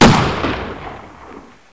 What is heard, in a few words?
gun Sound